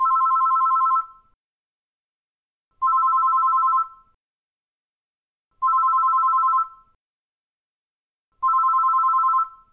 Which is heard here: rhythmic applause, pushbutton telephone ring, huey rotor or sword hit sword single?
pushbutton telephone ring